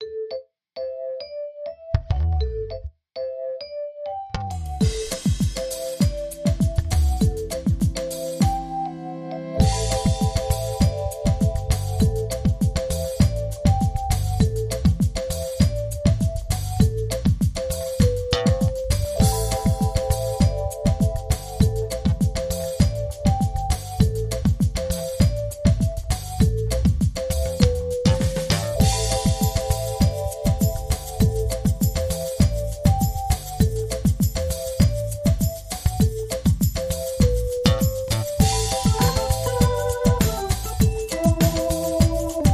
Cool Bossa

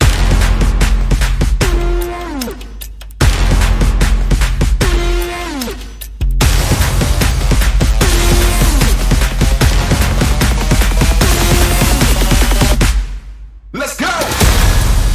No Lead Synths

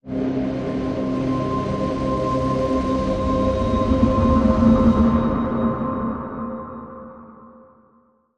Link Sting

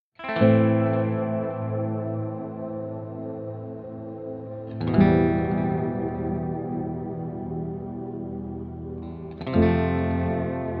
Only E Guitar Chords and Pads

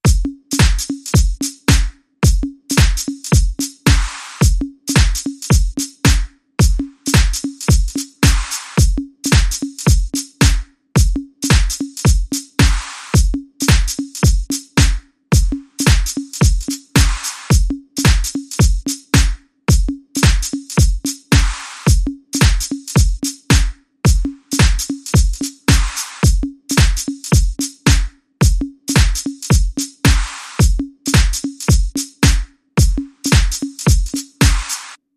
Beat

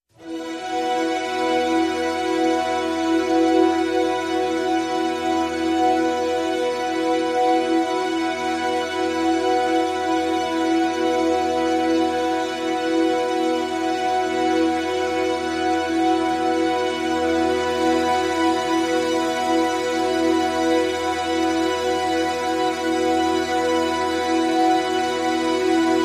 Collective Strings E

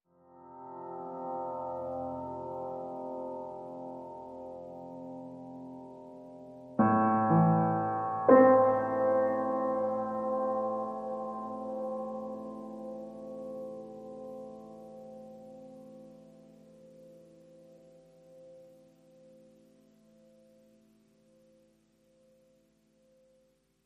Lead Piano Only Mix

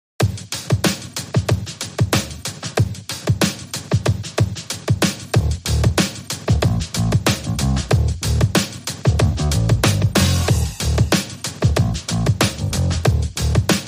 Drums and Bass

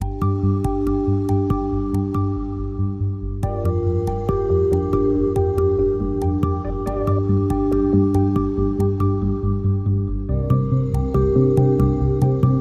Life Travels No Drums

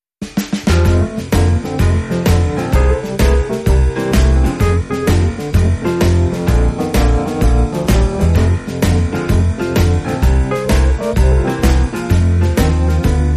Browny Shuffle

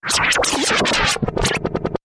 Abrupt Garble Burst, Taylor Grand

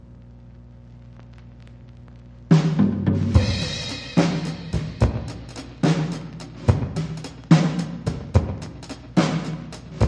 Drums

